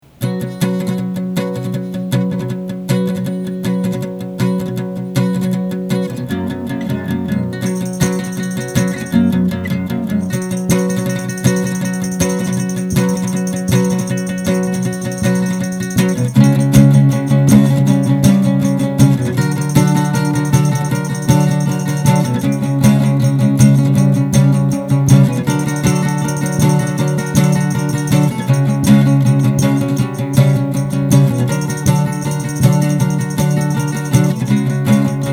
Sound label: guitar instrumental